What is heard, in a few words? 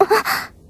combobreak; Epilogue